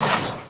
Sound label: suspen, Vehicle